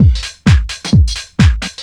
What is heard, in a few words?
Deep House 130bpm